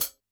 Pd Hat, drum

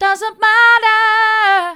doesn't matter mid, vocal

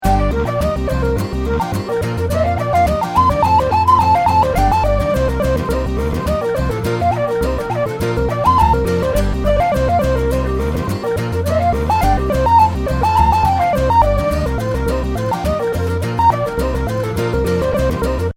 loop mono

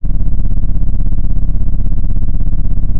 pop, Sound of an engine revving

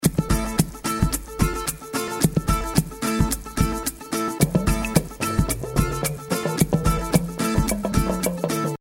loop

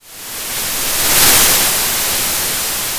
Sound of waves crashing on a shore

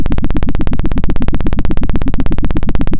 pop, Sound of a helicopter

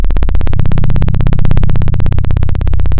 Sound of a plane taking off

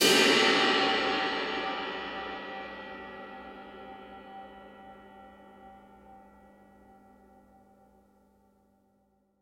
Multi Crash Lo, drum

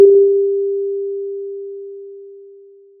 Sound of a church bell

